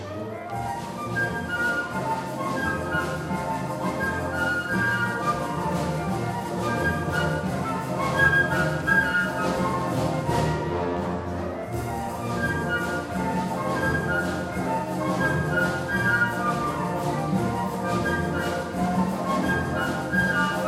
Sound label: Fiesta for flutes